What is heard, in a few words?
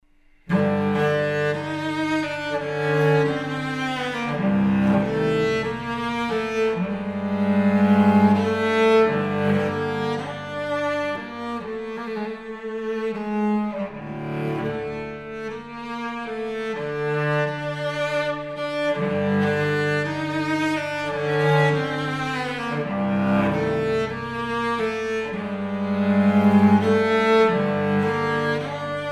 cello solo, tango; suite no3 noche de tango i